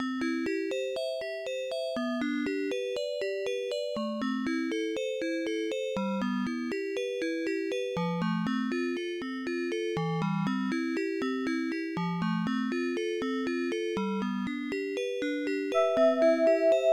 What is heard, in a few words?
lullaby; ambrstar